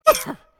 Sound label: Character Red Hit; angry birds epic android ios mobile 2014